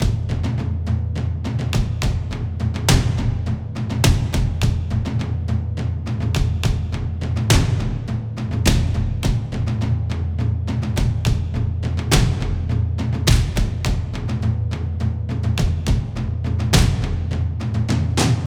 Drums Cinematic Energy Ensemble, Cinematic Trailer Loops